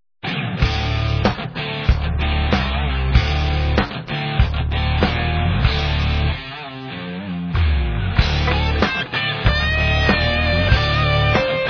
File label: hard rock song